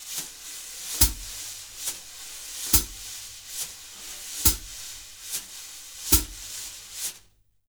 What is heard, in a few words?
BALLAD, Jazz Latin Drumloops